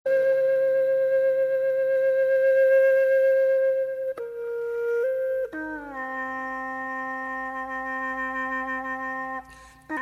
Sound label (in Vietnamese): tieng sao meo tay bac; nhac chuong; tieng sao tay bac mao meo